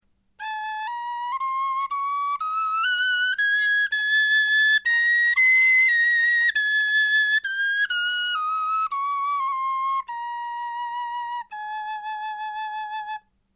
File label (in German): tonleiter, knochenfloeten